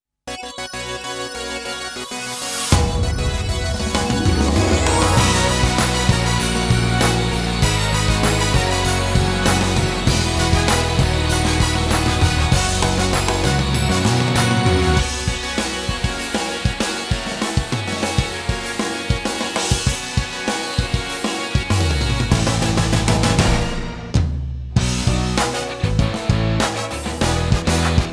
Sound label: Karaoke; Tokusatsus; Super Sentai; Tensou Sentai Goseiger